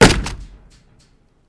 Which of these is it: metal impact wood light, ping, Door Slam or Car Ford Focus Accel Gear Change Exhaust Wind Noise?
Door Slam